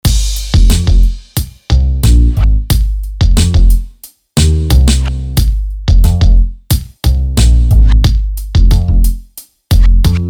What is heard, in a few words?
MPC-500